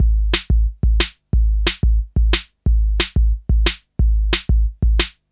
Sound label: Kick Snare Loop; Construction Kit; Drum & Bass